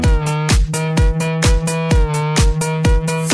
New Sms

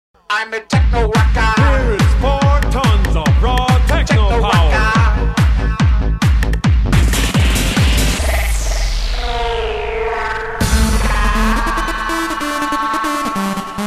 Technorocker

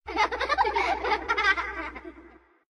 Laughing terror

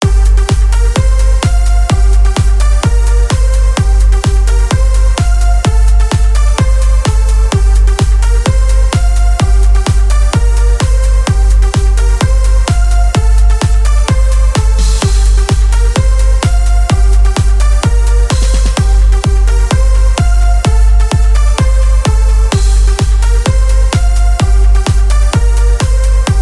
Ringtone remix

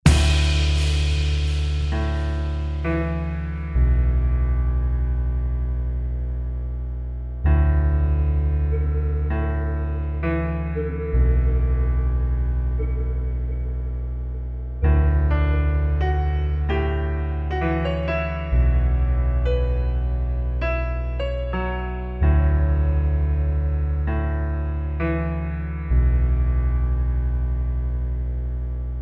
Piano Relax

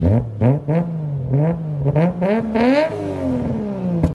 Skyline R34 Revs

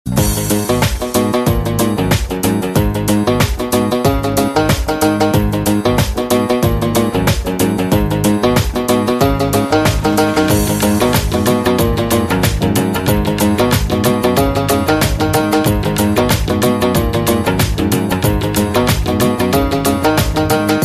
Cool Guitar

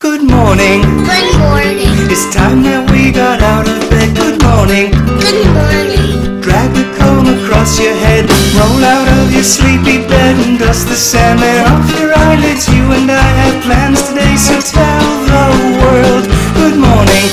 Morning Alarm

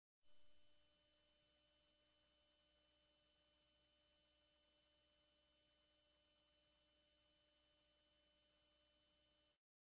High Frequency

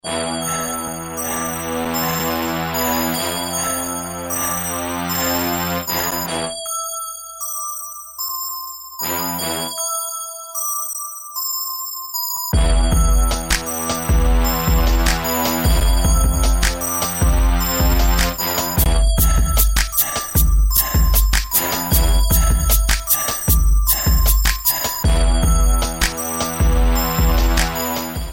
Beat buttons

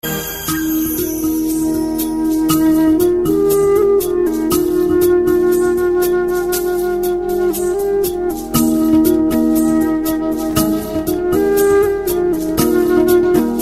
Beautiful Music

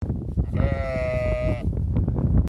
Sheep, Netherlands

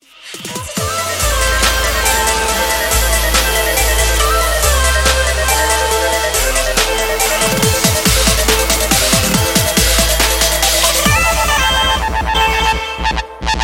Bass Talk

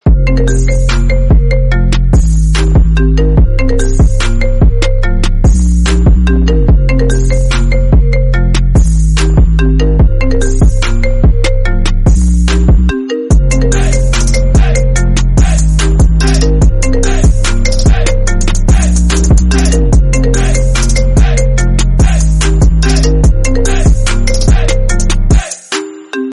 iphone 11 pro

